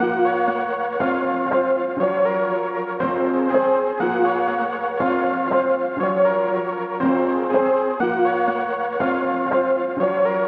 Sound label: 120 BPM A# Min, Melody Loops - Trap; Nimbus